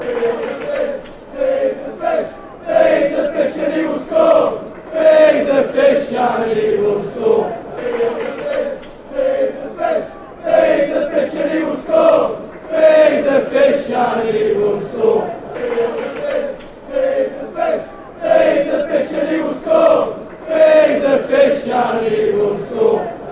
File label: fan chants; feed fish dcfc